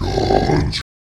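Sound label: zombie pig angry; Minecraft; Votive World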